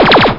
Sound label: mech las; dosgames; mechwar